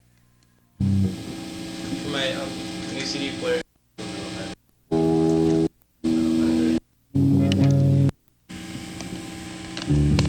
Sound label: calypso drone